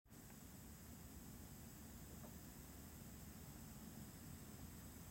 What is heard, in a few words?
noise rca; 光デジタル端子や同軸デジタル音声端子を、一般的によく使わている赤と白のRCA端子に変換するオーディオコンバーター（DACの一種）